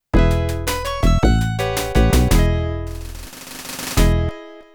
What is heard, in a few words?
Game Over (Mega Drive); Snake Rattle n Roll